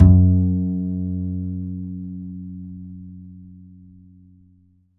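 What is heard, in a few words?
double bass F#2, Jazzistic; DBASS; Univers Sons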